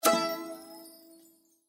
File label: counter end; Eternal Wishes LuckyTap